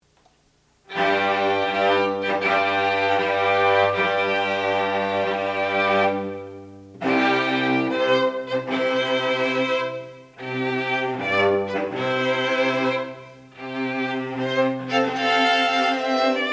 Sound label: Contemporary String Ensemble; Bridal Chorus